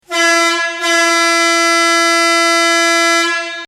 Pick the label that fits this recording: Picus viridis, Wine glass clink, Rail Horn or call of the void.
Rail Horn